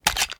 raise first open, reloads; gmod

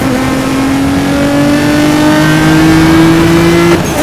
third, vehicles

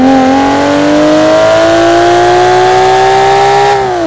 second, vehicles; gmod; tdmcars; skyline r34

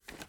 reload fast start, weapons; gmod; viper

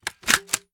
reload empty mag out, reloads; gmod

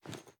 reload empty fast cloth; reloads; gmod; iw8

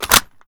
ar reload empty mag in, weapons; gmod; viper

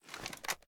ar reload dmr down; weapons; gmod; viper; scharlie

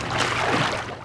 wade, player footsteps; gmod

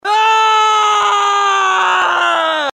scream, male; gmod; npc

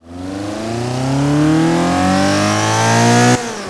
first, vehicles; gmod; tdmcars; golf2

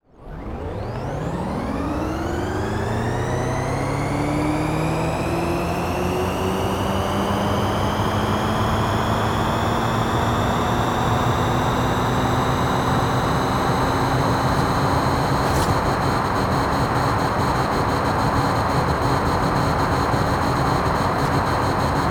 first, vehicles

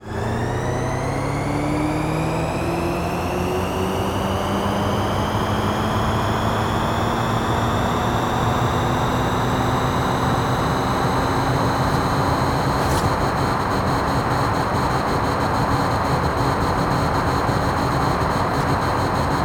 cybertruck

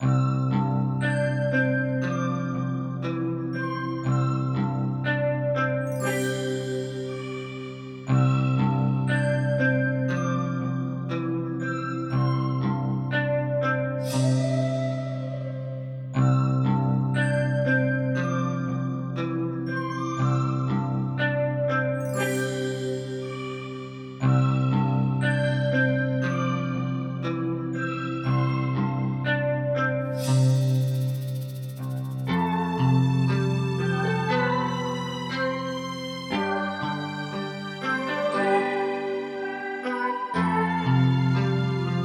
relaxing; stardew valley ost

